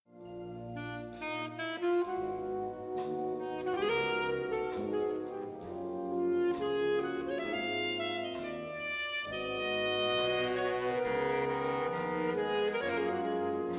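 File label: Solo, Saxophonist; Altosax; Swiss Jazz School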